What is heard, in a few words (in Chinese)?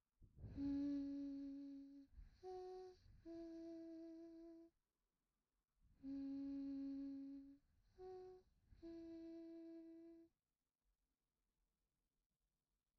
哼歌